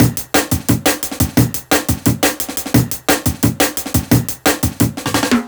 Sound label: classic dnb breaks, A, 175